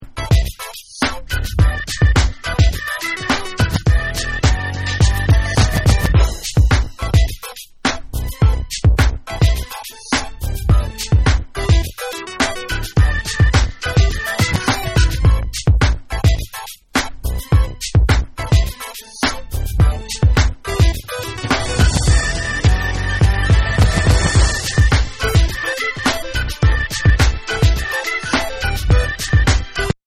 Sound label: Instrumental